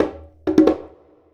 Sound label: Congas; Essential Percussion